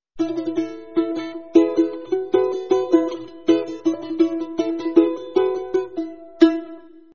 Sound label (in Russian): balalayka